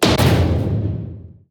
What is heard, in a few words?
damage hits explosions, Warships